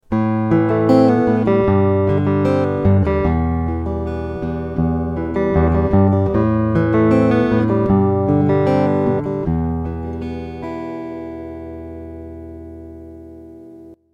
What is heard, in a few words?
sonic vx cable